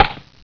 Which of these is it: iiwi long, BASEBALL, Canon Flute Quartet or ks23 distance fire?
BASEBALL